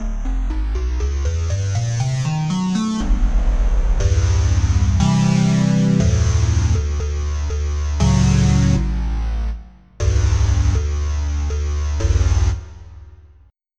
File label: P5 Sync Sweep